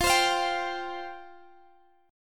G 7th Suspended 4th F

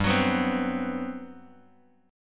G Major 11th, Bass Standard; strummed; JGuitar

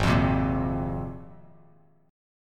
Ab 6th Add 9th Ab, Bass Standard; strummed; JGuitar

